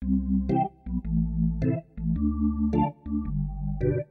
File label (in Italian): organo